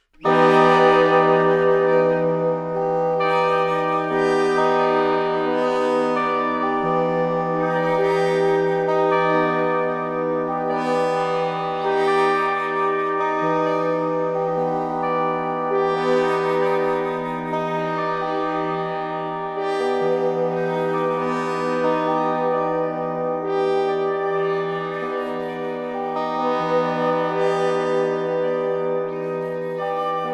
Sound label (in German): GLOCKEN; gullinkambi